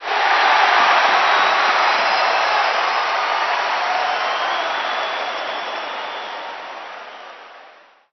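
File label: Basketball Crowd Cheer; SFX Kit; Crowds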